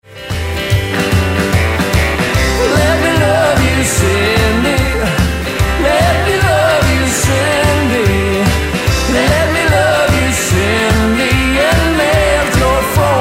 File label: Rockabilly Party